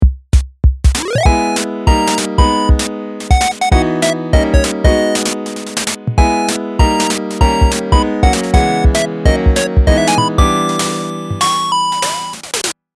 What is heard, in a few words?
Drum Melody Chord